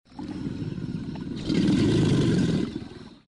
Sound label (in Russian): Rychanie